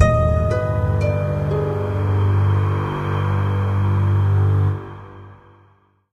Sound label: Alex Short Exc Drone, music